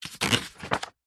household book thick heavy encyclopedia modern glossy page turn; zapsplat